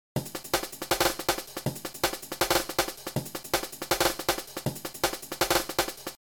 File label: Beat; drum kontakt; advanced drum editing in kontakt